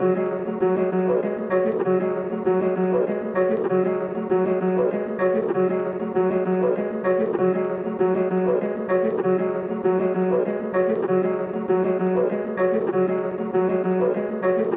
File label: 130 BPM F# Min Piano, loop stems; Melody; Cymatics; 2022 Melody Collection Platinum Expansion - Pt 2; Pegasus